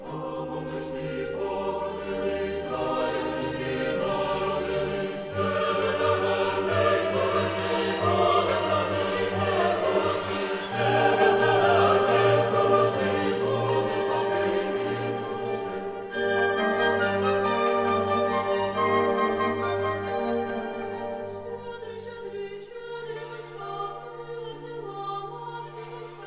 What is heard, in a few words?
chamber choir; shchedryk (generosity)